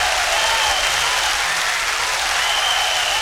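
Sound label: atmosphere, 160 BPM, drum & bass; Ueberschall; Drum & Bass Flash Trackz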